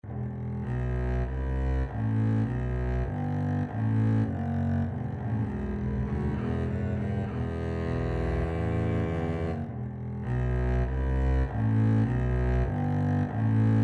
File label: double bass duet